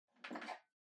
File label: Pendulum Clock Hardware Far Key Holder Take Out